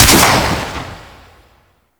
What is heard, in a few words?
Swarm Missile Launcher Fire 3D; Warframe